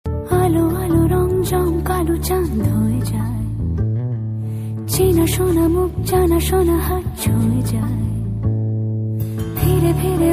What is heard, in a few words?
bengali ringtone